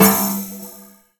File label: menu hit; tetrio